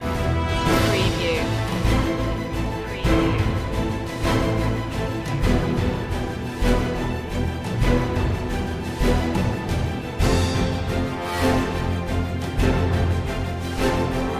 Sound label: no choir